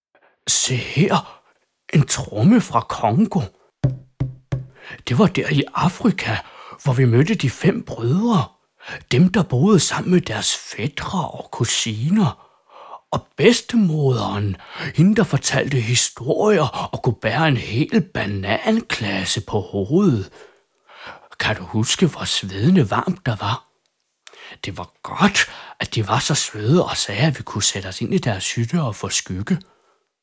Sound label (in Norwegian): Trommen fra Congo